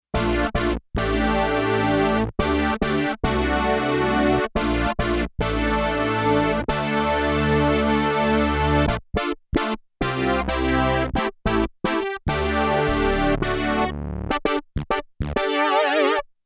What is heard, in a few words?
BRASS, Yamaha DX11; Factory Performance Voices